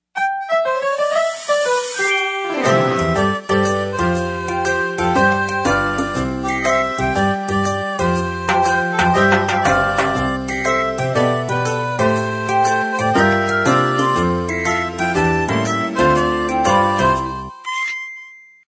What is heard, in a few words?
Kindergarten, Mood; Samsung SCH-i539